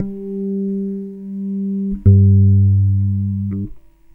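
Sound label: DANCE bass; USB Soundscan vol.32; AKAI; Roots Disco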